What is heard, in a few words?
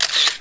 shuter; GORO